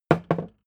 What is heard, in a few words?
hammer wood bounce